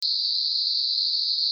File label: bark